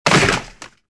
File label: bodyfall trooper; stormtrooper